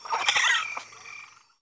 Jungle Restore Down; Win98sounds

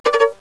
Musica Question; Win98sounds

